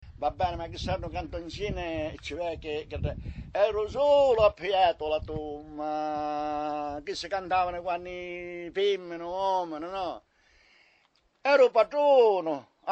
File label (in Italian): Stornello; calabria; E ru sule ha pijiatu la tumma